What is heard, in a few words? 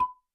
calls pop